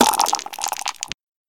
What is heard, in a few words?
Dice